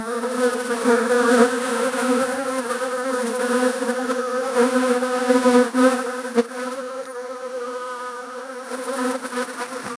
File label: flies, insect